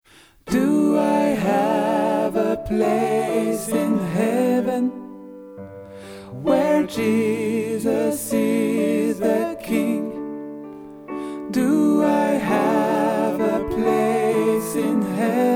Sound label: Altos, Gospel